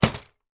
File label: toy robot fall over; sound effects one